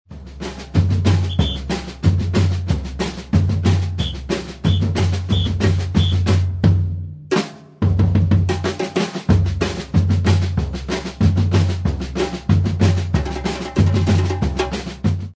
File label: Break low, סמבה-רגאיי; Batucada; מעבר 4 נמוך